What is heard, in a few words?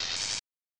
Electricity